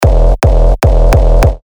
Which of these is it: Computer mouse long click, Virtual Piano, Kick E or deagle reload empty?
Kick E